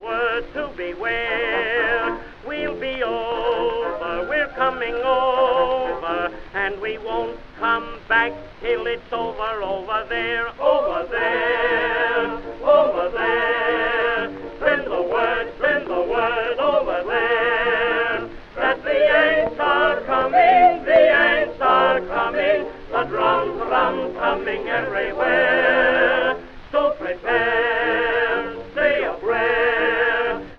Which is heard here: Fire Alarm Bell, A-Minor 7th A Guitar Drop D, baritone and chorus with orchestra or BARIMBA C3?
baritone and chorus with orchestra